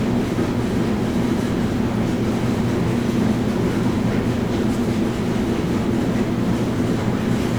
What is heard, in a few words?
machine